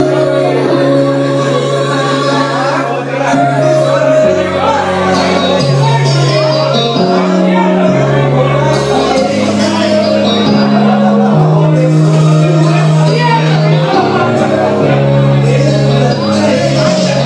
karaoke en hombres lounge

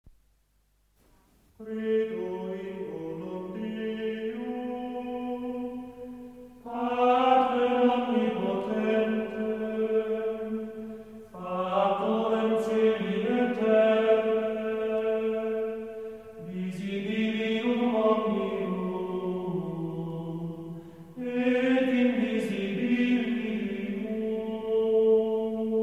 credo canto gregoriano

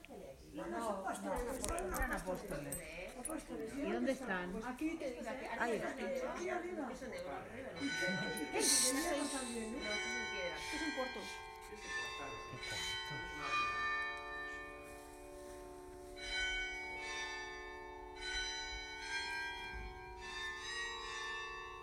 campanas del santuario de Arantzazu

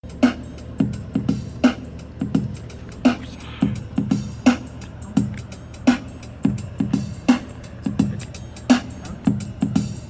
rap; Radio Coruja

